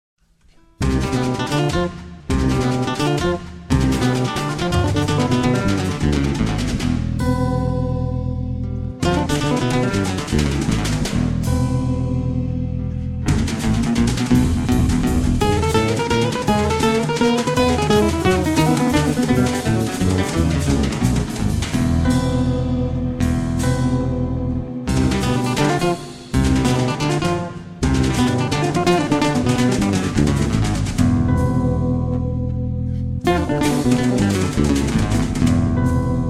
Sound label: Trio Etudes Simples; Leo Brouwer